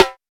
Clear Snare Sample F# Key, steel snare drum sound tuned to the F# note